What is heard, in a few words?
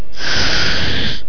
zombie alert